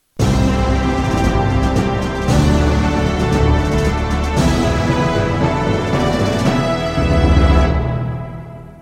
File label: Boss Defeated; Akimbo Kung Fu Hero